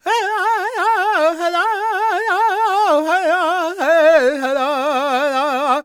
C# MAJ, World Voices, LAKOTA; Spectrasonics Vocal Planet CD5

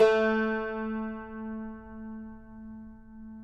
CUMBUS A, Guitars; Spectrasonics; Hans Zimmer Guitars VOL-2

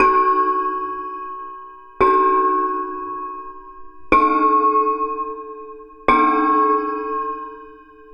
Shunt, Ambient Metals; Sonic Foundry (Sony Creative Software) - Ambient Atmospheres & Rhythms

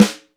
SD BONUS, Drums; Best Service; Real Mega Drums VOL-1

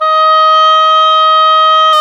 OBOE, Orchestral Winds, Oboe mf f

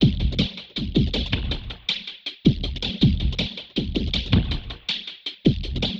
Noise Beat; Drums & Drones

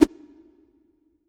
STAIR, AIRSTICK WET; Q Up Arts; Denny Jaeger Private Collection

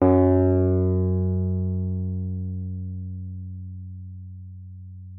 WURLITZER F#2; Xsample VOL-1

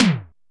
drm, b1, EMU SP 12, DRUMCOMPUTER

